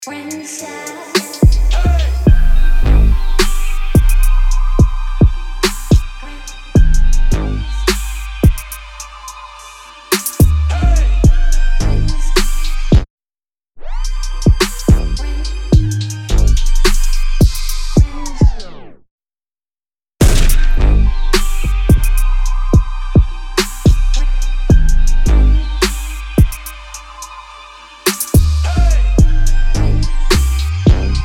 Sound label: Instrumental